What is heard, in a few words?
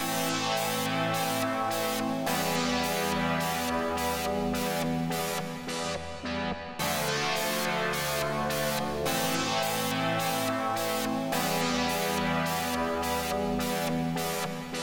chord sequence audio